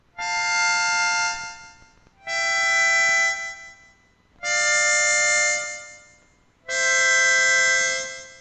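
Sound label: Rhythm breathing pattern, harmonica; reggae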